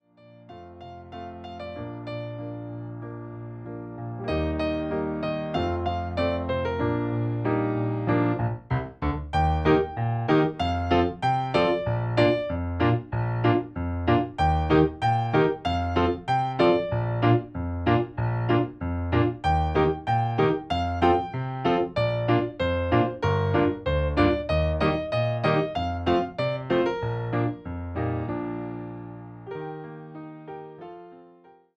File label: instrumental